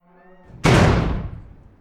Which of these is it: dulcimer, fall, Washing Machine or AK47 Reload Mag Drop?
fall